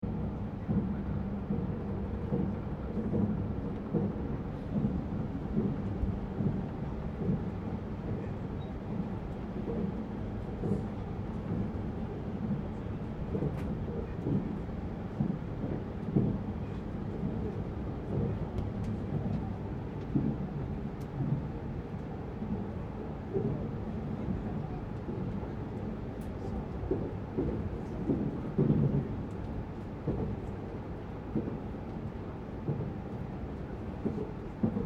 Train Series E731 Express Seating Area Operating Loop, Japanese Trains; Aomori; Vehicles